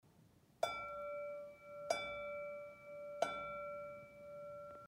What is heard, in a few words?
Harmony Flower D5; Orange